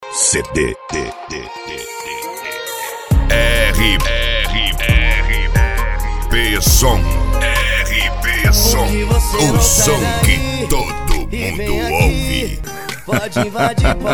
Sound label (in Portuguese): sertanejo universitario